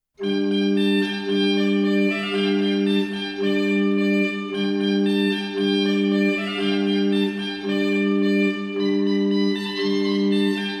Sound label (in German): Ungarescha Dudelsack Quinte, orgel; Tabulatur Jacob Paix 1583; orgel kremsmuenster